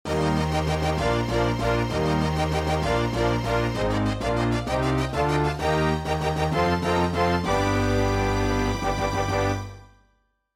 MIDI Fanfare; Star Merc

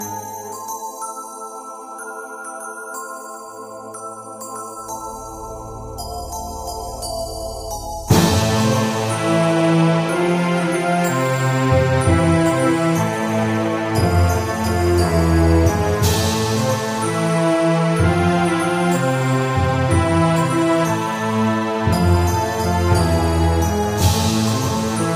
End game music; wik and the fable of souls windows xbox 360 gamerip 2004

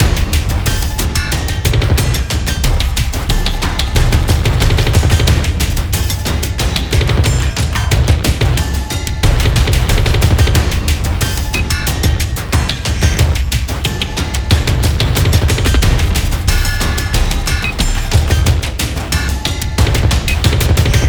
Mountain Small Fight; sky break

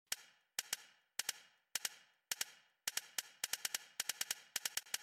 Riddle Statue Sounds

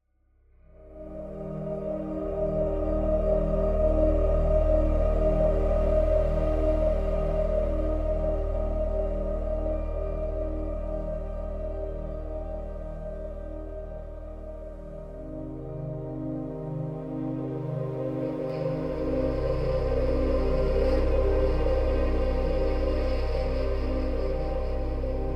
Explore Ruins Electroflutes; horizon zero dawn

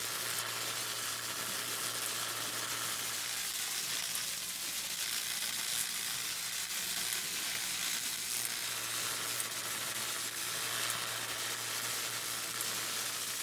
Electricsparks Lp; Rabbids Coding

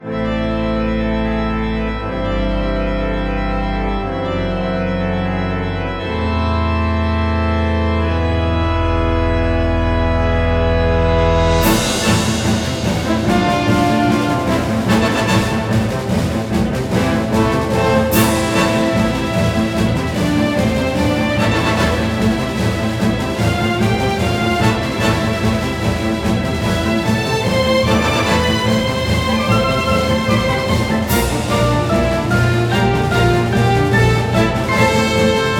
Boss Battle Intro; Gran Saga

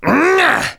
Emote Effort; Light Street

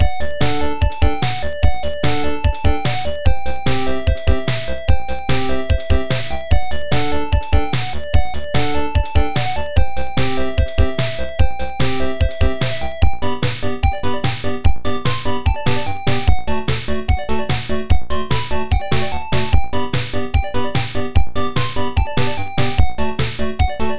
Bonus Game; magical crystals

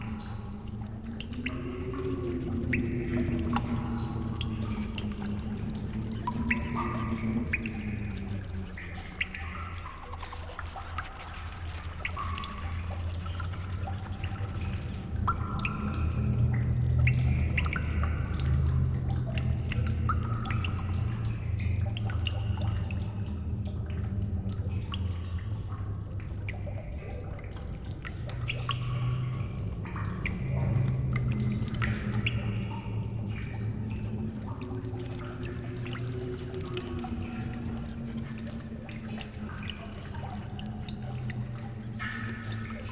Cave Effects; A Bloody Night

